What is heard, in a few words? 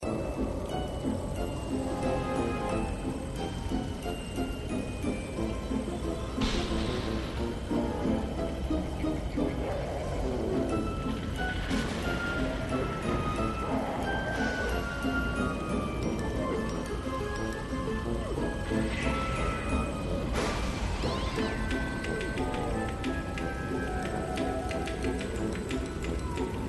BGM Normal; slots; Halloween Blast